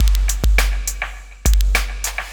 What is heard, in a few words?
clatter, Drum loops Electro